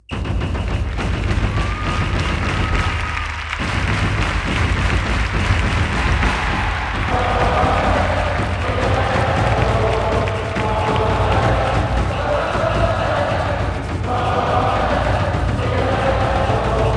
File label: Chants Brazil